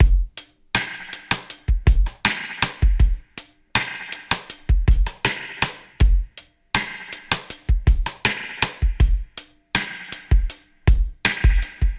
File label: 80 Processed Drums, Live Trip Hop Drums; Loops; Smoke Signal